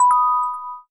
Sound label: Collect; meteorgame